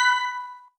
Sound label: opponent diamond; MBHaxe